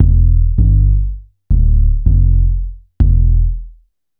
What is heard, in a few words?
FUTURIST DRUM N BASS